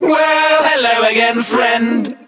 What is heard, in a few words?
well hello again friend SUNG; Voice; introductions; hello hi hey